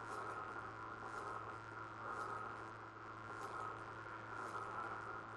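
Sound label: ambience neon sign; angel city; Titanfall 2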